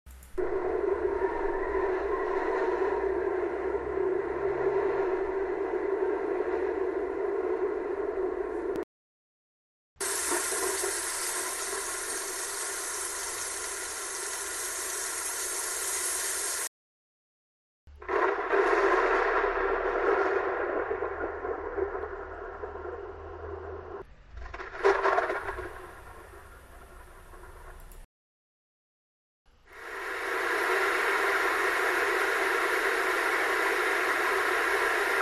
air rain thunder waterfall waterdrops ocean

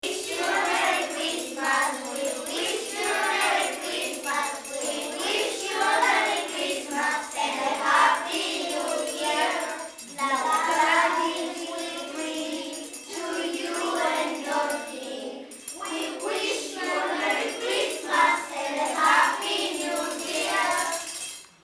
We wish you a Merry Christmas

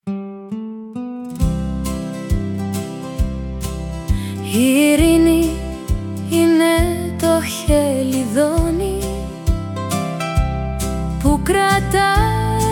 PEACE SONG; etwinning

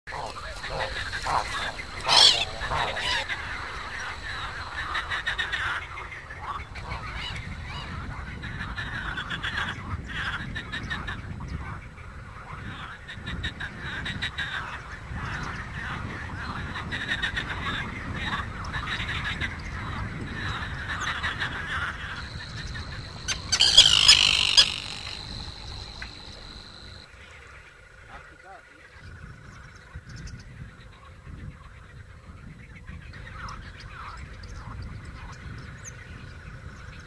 Pelecanus crispus Dalmatian Pelican; Къдроглав пеликан

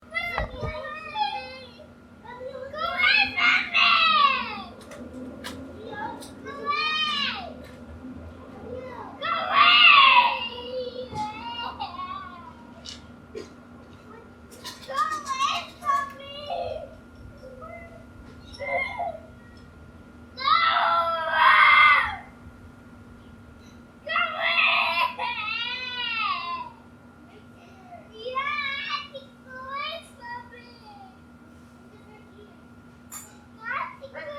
Kids Fighting Sound

